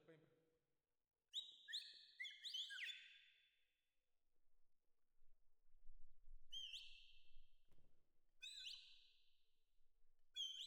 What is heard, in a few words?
Perc Main